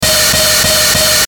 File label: Static Fuzz Harsh Alarm Loop, Static Pan Sweep; Production Element Imaging Element Accent Transition; Blastwave; Static Sound Effects